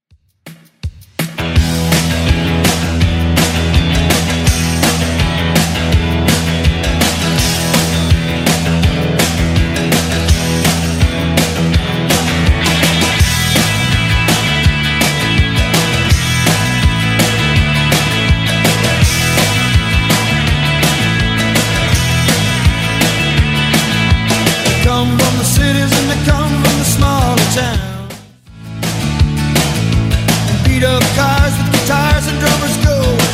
80s Rock Redrum 165bpm

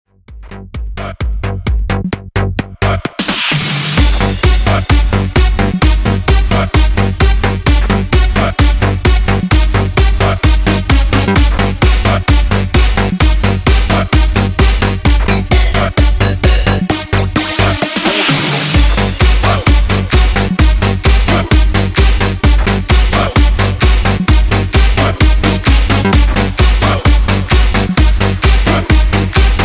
Epic Mashup 130Bpm

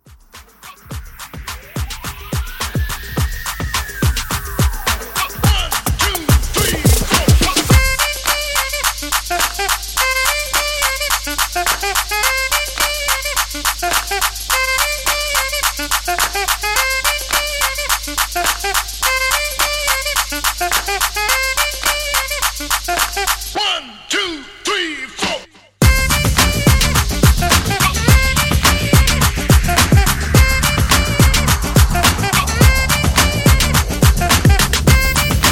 Old School Epic Twerk Re Drum 106

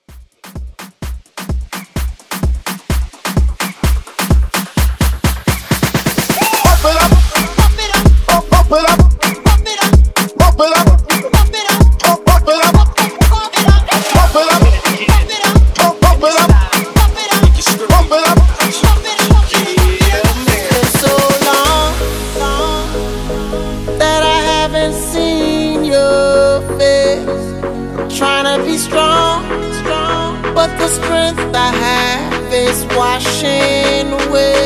Phonk Afro Hype Rework 128

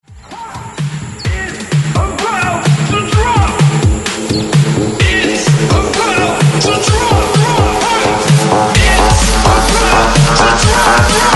128 BREAKMIX; DJDOLLS

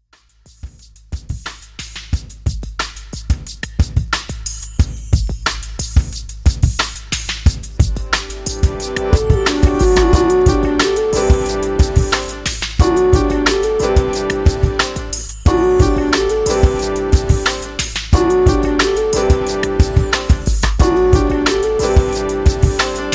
90s RnB Re Drum 90 Bpm

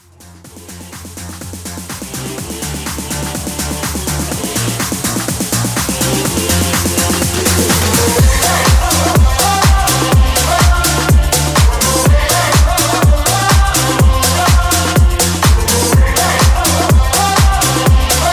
House Mashup

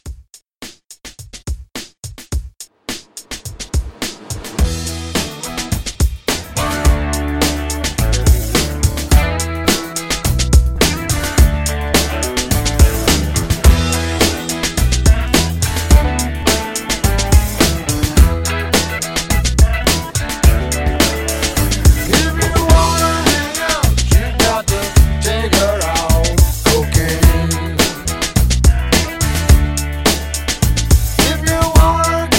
70s Rock Re Drum

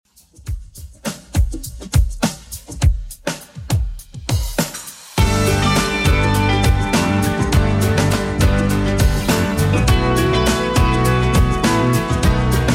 90s Redrum 102bpm

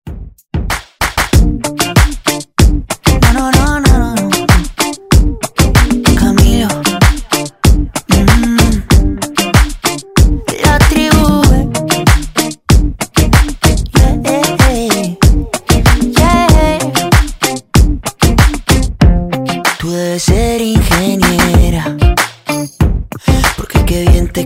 Milliones Funky Rton Redrum Clean

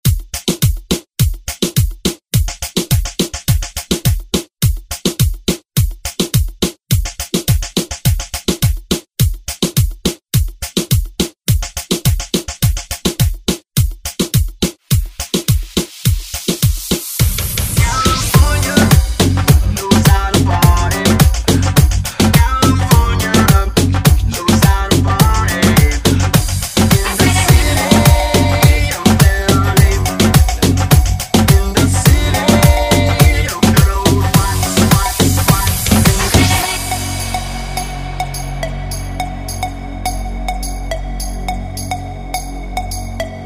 Moombahton Hype Redrum

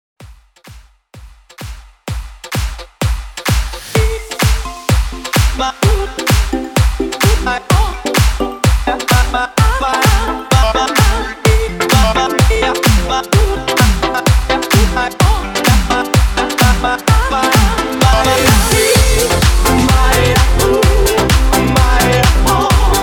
House Mix 128 BPM

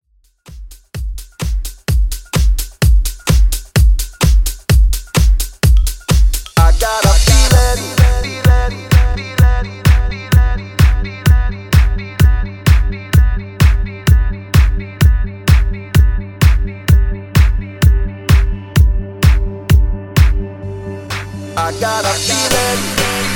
Club Extended 128 Bpm; djyan

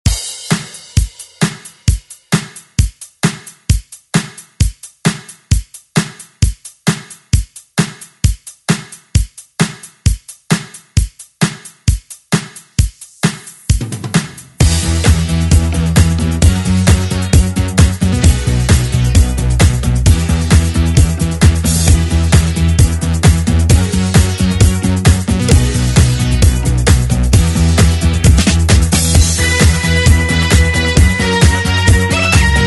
Re Drum; DJ Mhark

